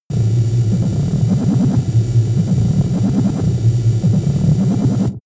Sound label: space alien machinery working